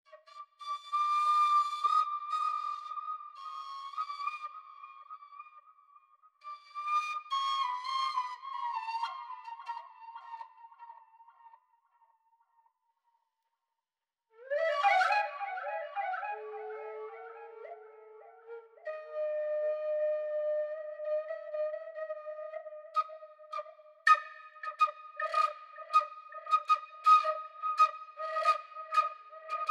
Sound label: Flute; gamerip; Raji: An Ancient Epic